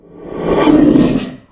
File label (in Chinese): 受伤; Enemy; 鬼,幽灵